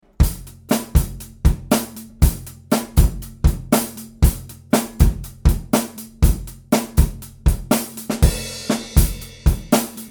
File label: EAD Overheads, Yamaha EAD10